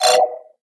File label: scoreboard success; firefight